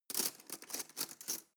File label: cutlery teaspoon drawer handle